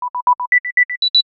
accessory locate Sound; iOS 26 Sounds

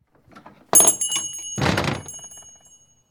store close; Original TK Sounds

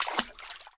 water step; Golden Crayon Sounds

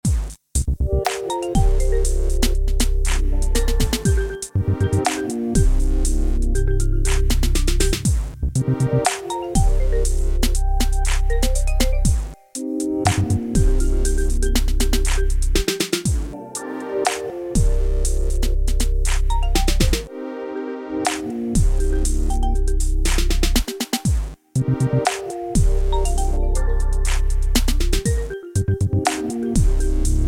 background; RS Games Sounds

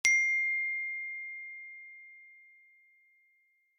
Note, iOS Sounds

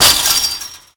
wall fence; Golden Crayon Sounds

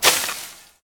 wall bush leaves; Golden Crayon Sounds

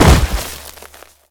concrete hard land; Original TK Sounds

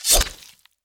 Empty Space; ESAN VO Sound Schemes; ESAN Horror pack

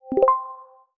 workout announce; iOS 26 Sounds

